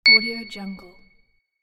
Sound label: ding; minimal-studio